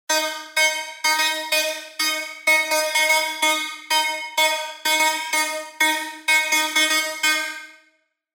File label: LD, bass tech house; Body; revealed serum 2 bass tech house vol 1